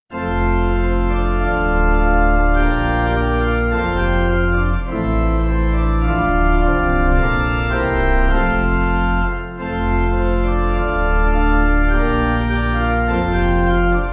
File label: Holy Manna Pipe; Blessed Feasts